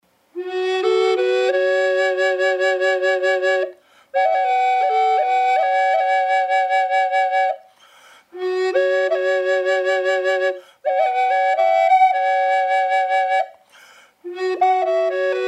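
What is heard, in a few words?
Fiddleback F-sharp minor dry, flutes drones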